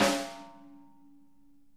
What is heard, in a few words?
snare edge, Interactive Drum Kits; Sonic Reality